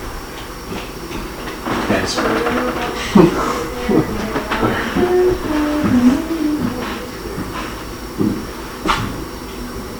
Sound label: Living Room Unexplained humming AMPLIFIED